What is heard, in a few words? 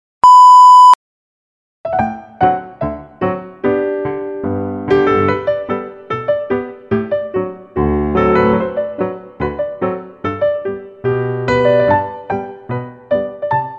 Floor Routine Polka